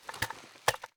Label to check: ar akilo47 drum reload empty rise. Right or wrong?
Right